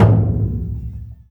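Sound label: LOUD DRM, loud drum; Spectrasonics; Heart of Africa 1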